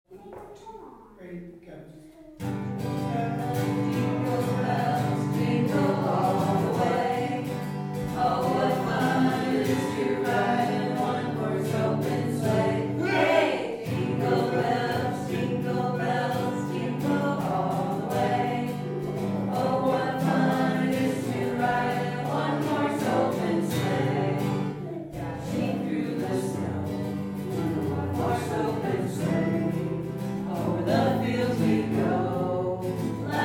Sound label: Jingle Bells